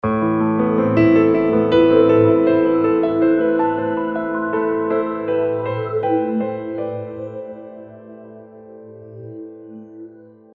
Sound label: jingle